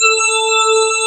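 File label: ambient pad, synthesizer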